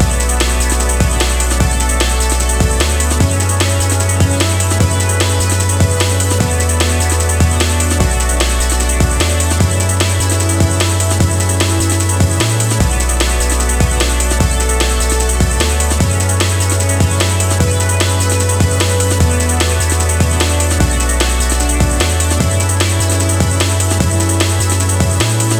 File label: dnb schnell